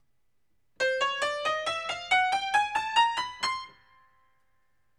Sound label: all notes C5